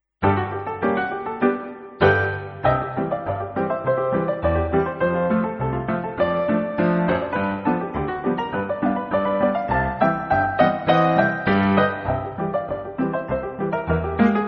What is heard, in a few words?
saute